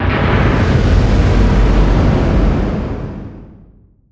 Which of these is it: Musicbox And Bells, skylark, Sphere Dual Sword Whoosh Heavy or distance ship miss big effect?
distance ship miss big effect